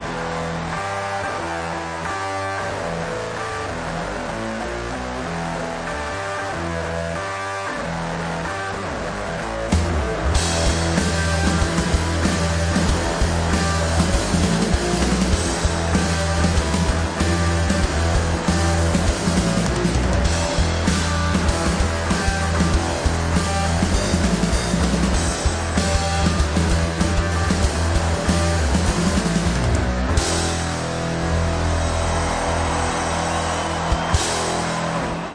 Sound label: Riff